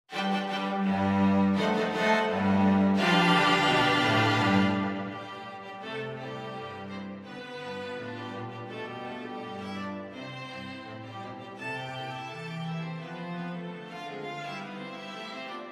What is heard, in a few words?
Rag quartet; Birthday